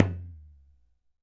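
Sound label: KHOL HEAD, DRUMS OF IND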